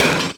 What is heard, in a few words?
SD, Drum & Bass; Ueberschall; Drum & Bass Resonance